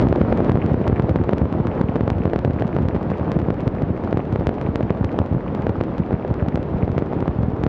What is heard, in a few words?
vehicle surface uneven stone, driving; Factorio